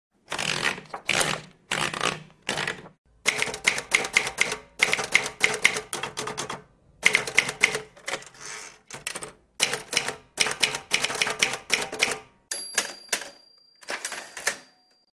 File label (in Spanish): MAQUINA DE ESCRIBIR